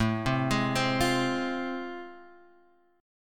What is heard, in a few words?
A Minor Major 7th Sharp 5th, Guitar Standard; arpeggiated; AmM7#5; JGuitar